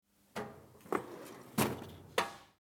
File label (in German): Schublade